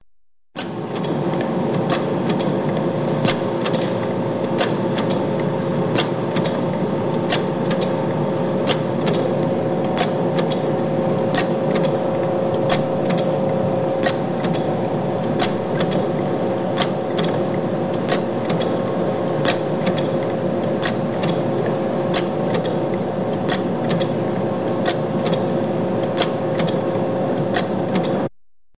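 tobacco factory cigarette production machine working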